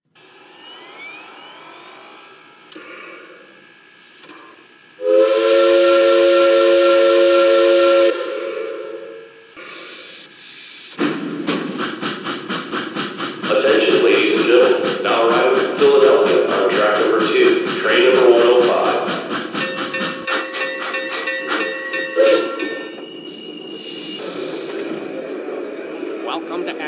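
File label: 442 Atlantic, trains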